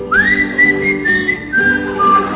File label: whistle